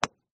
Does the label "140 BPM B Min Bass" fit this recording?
No